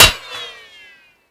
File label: metall, bullet collide; STALKER; IWP